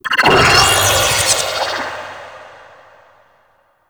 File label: Object Change Activate; Warframe; Harlequin